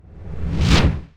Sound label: spell whoosh; Combat